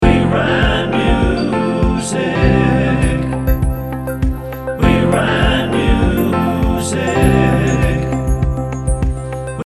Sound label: jingle